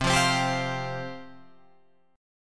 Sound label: C Suspended 4th C, Guitar Open F; Csus4 strummed